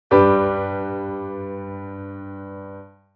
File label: G chord